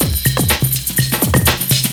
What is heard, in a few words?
LOOP, BREAKBEATS; Crypton - DrumBase5000